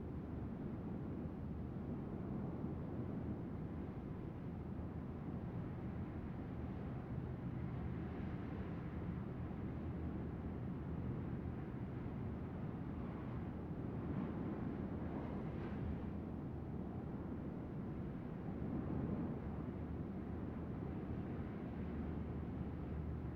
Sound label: Soft Wind; LOOPERS